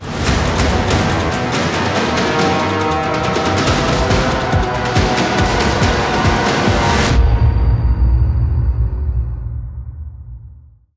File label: Mission Fail Cue; Zariman; Warframe